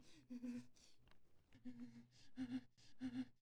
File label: horror "obie"